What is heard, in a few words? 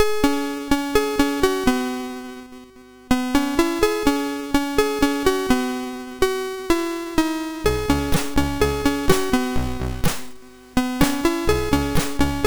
Pianko, MOS6581R2